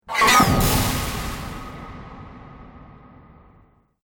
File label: Droid Power Down, Robot Sound Effects